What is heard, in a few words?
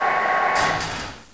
slide; Gamezone